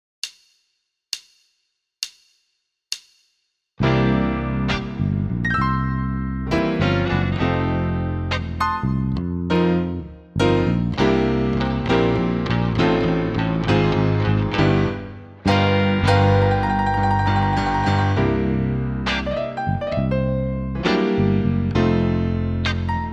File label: Backing Slow Blues